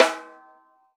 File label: RAWSD3V1, Drums Acoustic; USB Soundscan vol.10; AKAI; 90s sample CD; ALL SD